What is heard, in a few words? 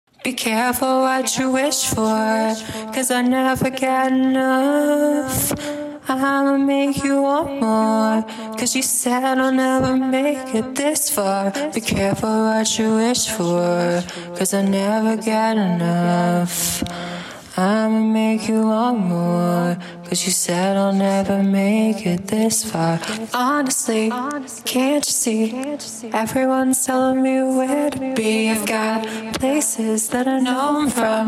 122 BPM Key Am